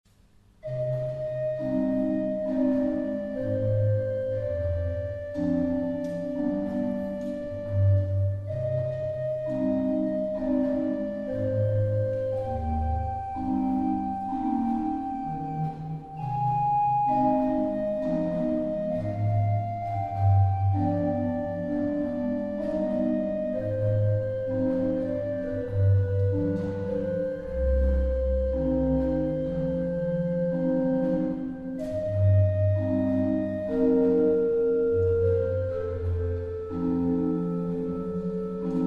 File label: Poco lento C-dur, Orgelmusik; Etelhem